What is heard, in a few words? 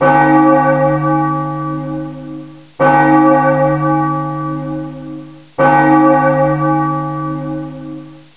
bell, ambience; quake; fortress